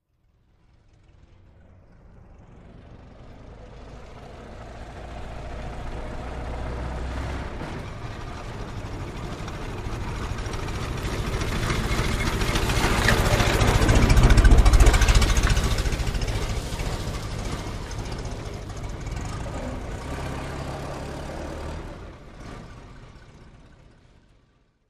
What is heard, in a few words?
Model-t, By; Drive In And By Smoother Running, Some Creaks And Acceleration On Away. Medium To Close-up.; Ford Model T